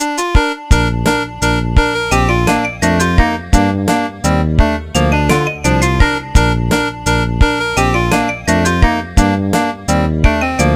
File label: snes